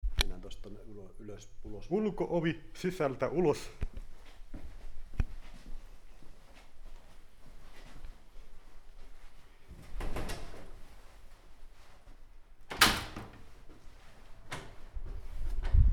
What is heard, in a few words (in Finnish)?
ulko ovi